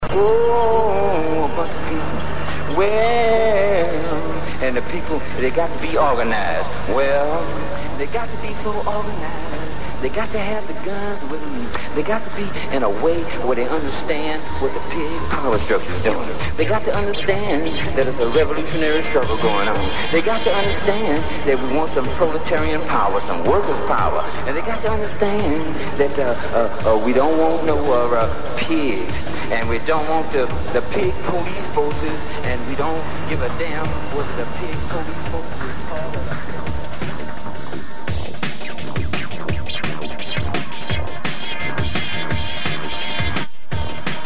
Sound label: jungle drumnbass raggajungle breakcore